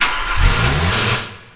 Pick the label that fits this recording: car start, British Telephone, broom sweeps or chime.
car start